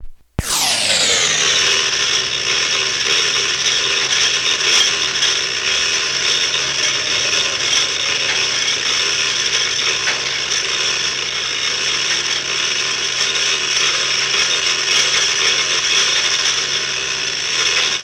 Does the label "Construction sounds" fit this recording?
Yes